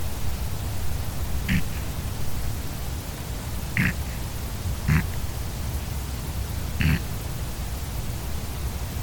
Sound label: Flight Call